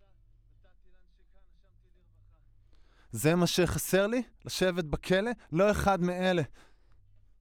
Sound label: Voc